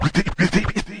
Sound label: deep, Scratching and Turntablism